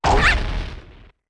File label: Deku Link Launch